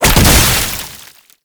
Tripwire Explosion; Enemies; Gameplay; Boss; Warframe; Oraxia

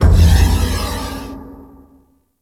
Oro Reactor Piston Move; Warframe; Orokin

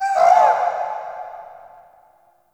Deer Ruckus Vocal; gameplay; Warframe; Entrati Labs

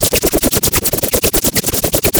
Boomerang Loop; Warframe; Weapons

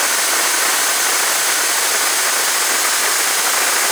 Flare Burn; Warframe

